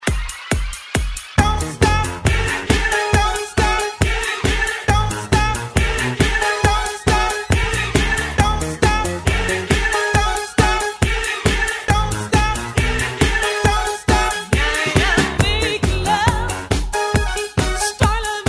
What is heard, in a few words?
70s Hype 137Bpm